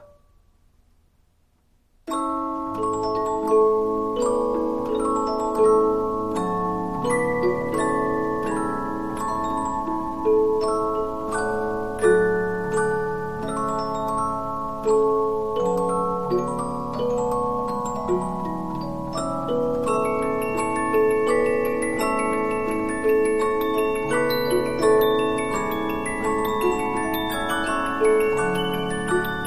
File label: Polyphon, 15inch disc musicbox